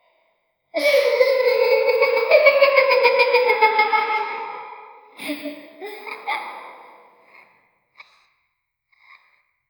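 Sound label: a long lingering, ghost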